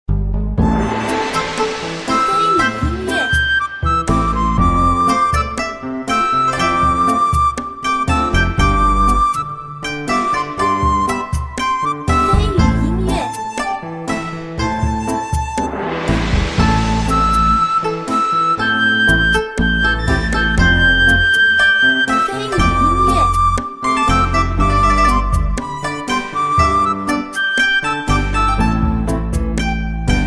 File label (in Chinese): 诙谐-喜悦-轻松